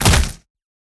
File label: body impact ground